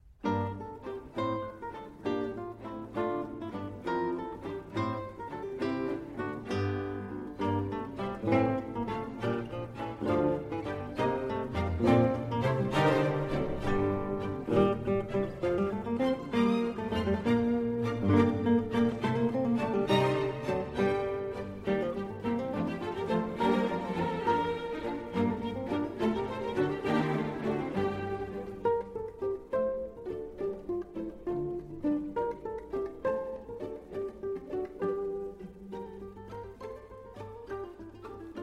Capriol Suite for Strings, Tordion, Con moto, Arr. for Guitar; Warlock